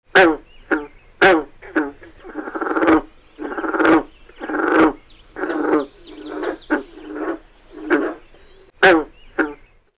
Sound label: Lithobates clamitans, Amphibia